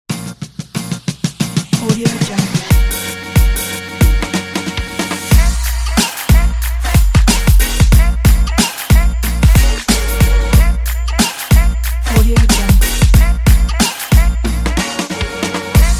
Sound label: urban boom bap hip-hop